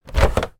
office printer hp photosmart c3180 scanner lid lift